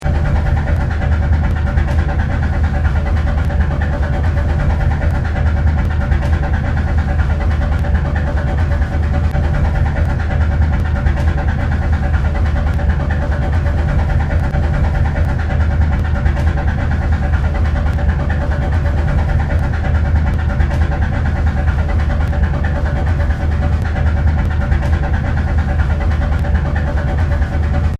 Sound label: Ship Engine Running loop; Tom Hutchings